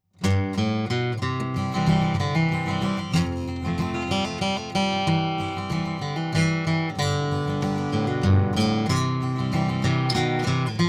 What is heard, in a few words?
pick Santa Eq; ピックストローク; ここではピックを用いたフレーズを弾いていただきました。; SantasticEQ